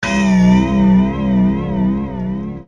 stop watch; ttyd

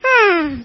sigh

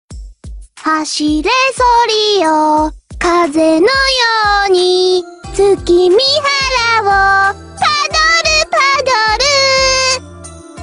christmas song; saber nero

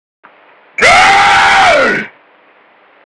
ahh aaaaaa

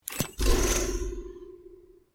ping; apex legends

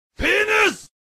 penis sound effect

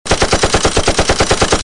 gun

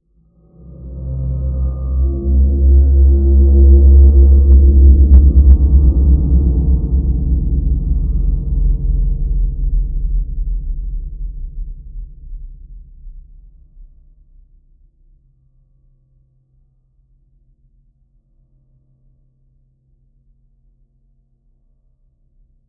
demonic warhorn

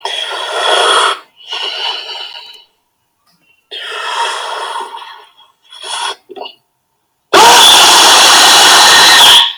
loudest scream ever

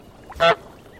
honk muffled

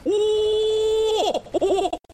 slow oh hoh

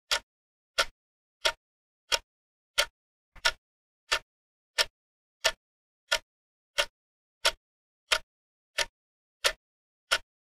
white rabbit clock

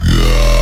yeeeeaaah

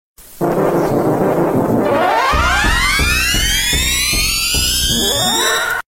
opening fanfare

